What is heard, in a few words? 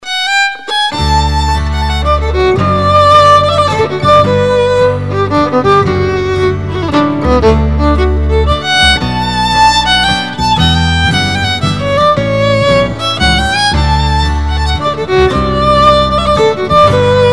Waltz